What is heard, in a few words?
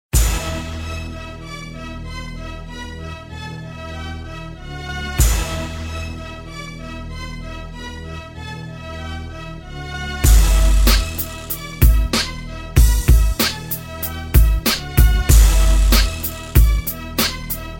hip hop beat